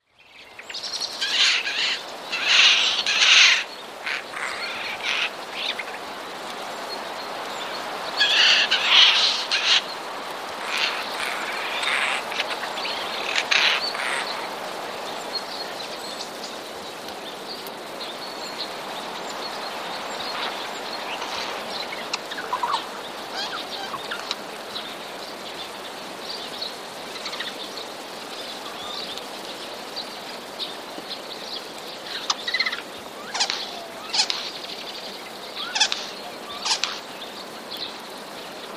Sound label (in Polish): sojki; skrzypienie, grzechoty, popiskiwania i różne rodzaje krakania